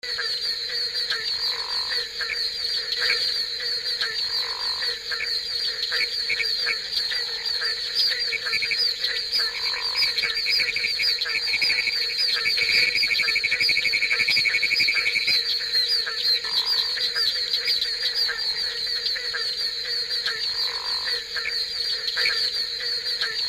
frogs barking treefrog chorus

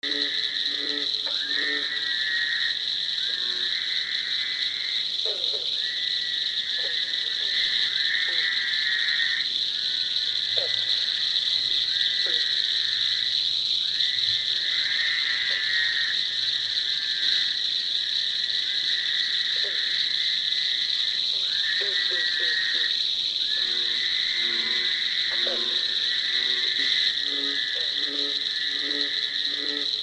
frogs many singing

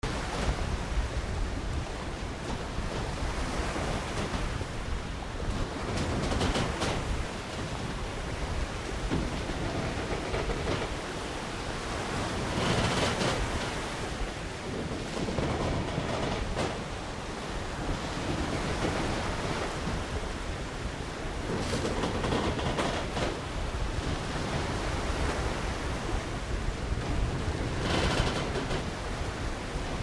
harbor ship creaking at sea

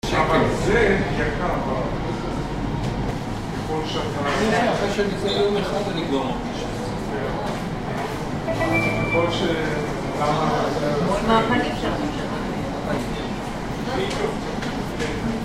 without music; germany berlin mcdowells

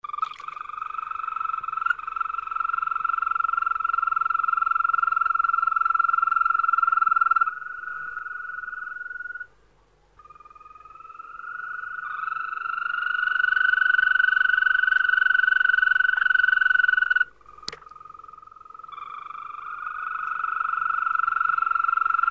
frogs green toad

